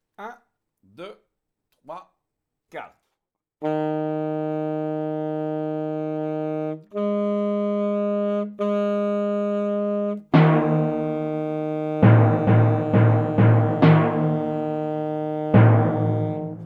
marche, saxophone

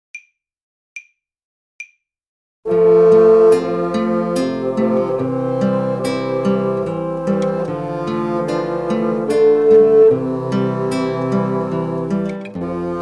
intrada basson, klezmer

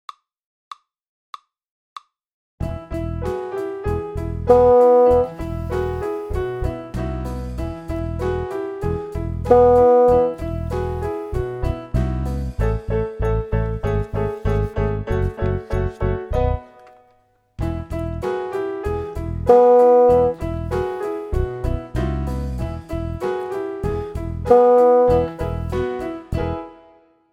basson

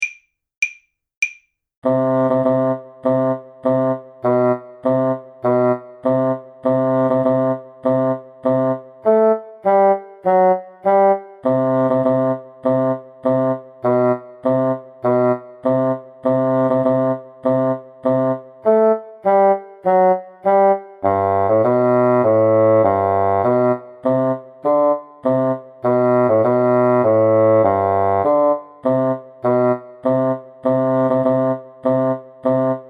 marche soldats trio, basson

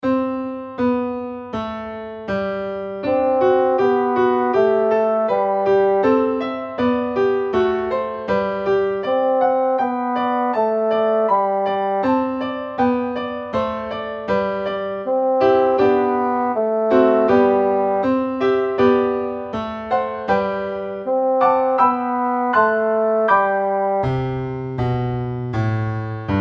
ostinato, basson

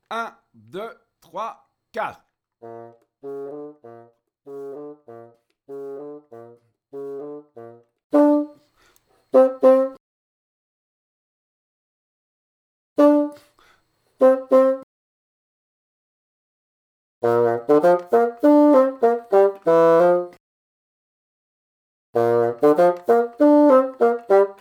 baby elephant basson

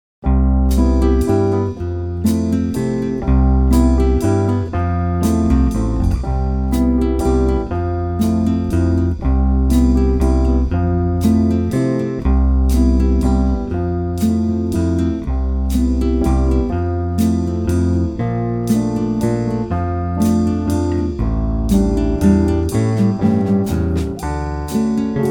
basson

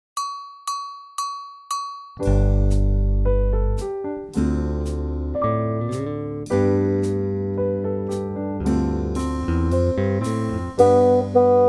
basson

